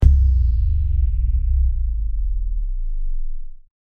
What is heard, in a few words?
slow motion slow down, bass hit